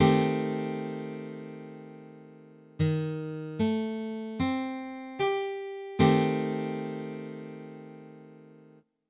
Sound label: Cm6, Guitar Chords